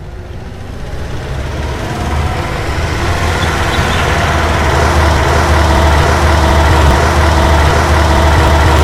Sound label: bus start; grade3; mero bhumika